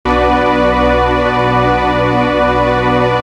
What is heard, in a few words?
sample pads, jungle; Jungle Frenzy 1